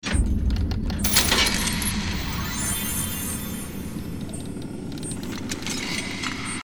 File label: DOOR OPEN BUBBLE